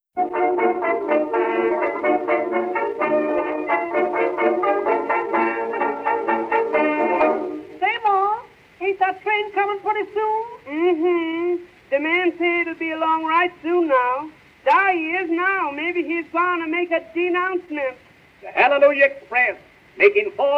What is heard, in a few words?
Gospel Train